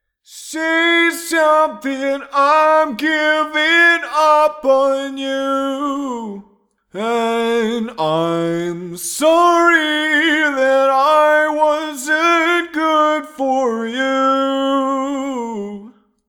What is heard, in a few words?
singing test